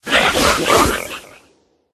sea monster buff

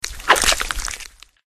crab attack voice bottom

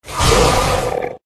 Two headed Dog Block

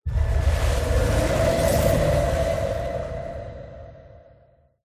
idol Water

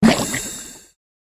murena wound

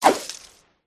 fur tree dodge

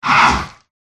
sea monster wound

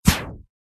mage male attack contact middle

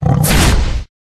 Tiger

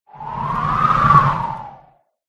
dragon mage buff

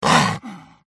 lyubimka wound

